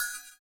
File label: CYM, Ethno Techno; ILIO - Ethno Techno